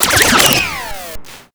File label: Take Damage; Raypunk